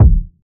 clean kick drum d key, kick single shot tuned to the D note. Loudest frequency: 101Hz